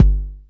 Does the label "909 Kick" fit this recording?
Yes